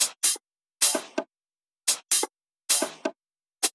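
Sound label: Drum Loop 128BPM hi-hat Loop; VEC5 Drumloops; VEC5 Multi Loops; Loop Elements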